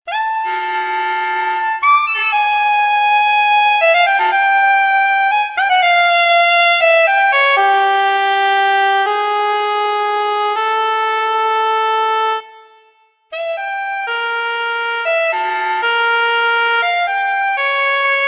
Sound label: SAX